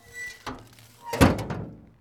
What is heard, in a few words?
Big Trash Can